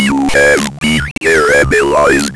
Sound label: Game Over